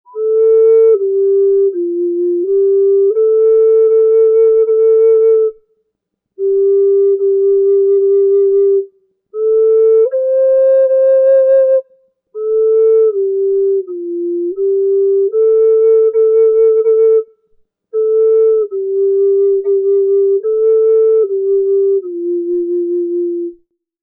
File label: Mary Had A Little Lamb Key D